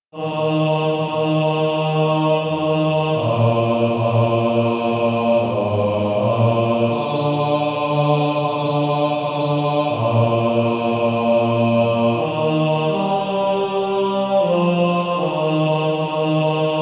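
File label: Male Choir, bass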